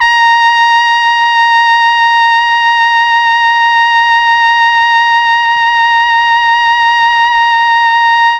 A#3 TRUMPET, Mellotron; Mike Pinder - Mellotron Archive CD

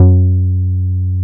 ULTRA F#2, BASSES

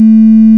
SYN, SYNTHESIZER; DIGI WAVE #1

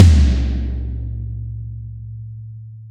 TOMS, Real Mega Drums

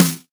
snare, Roland R-70

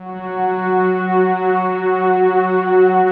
rich mellow, Rich Pad

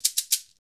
PRC, AFRICAN PERC; Roland LA

